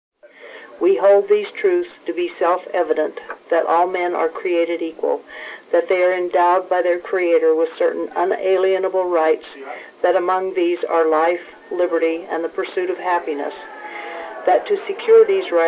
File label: declaration crowd fm